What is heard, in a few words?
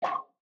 swap